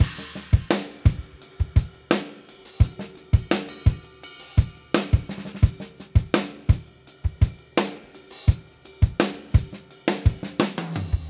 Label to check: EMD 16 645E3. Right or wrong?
Wrong